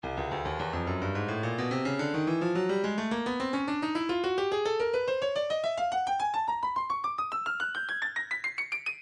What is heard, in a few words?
breakbeat midi 107 bpm; zolar plexus e; Stylus Breakbeat Midi Files